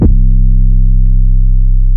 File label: Not Nice 808's